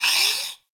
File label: Moa Leg Movement; Warframe; Footsteps